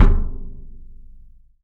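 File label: RESONATING KICK; 3D Drums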